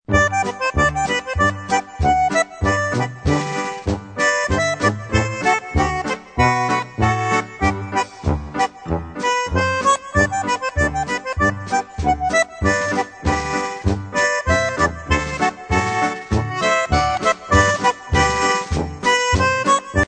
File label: HONEY POLKA